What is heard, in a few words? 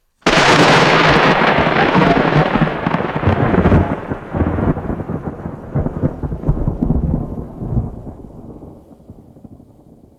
sharp thunder crack and deep booming rumble; weather